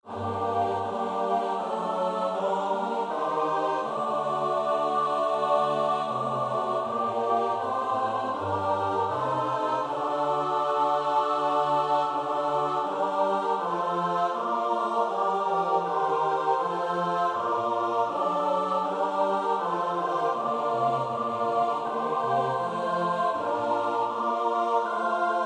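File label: soprano alto tenor bass; Bach (Johann Sebastiaan); BWV 089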